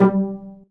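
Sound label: STRING PIZZICATO D2, STRING SECTION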